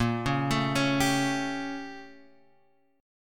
A sharp Minor Major 13th, A sharp, down, Guitar, Open G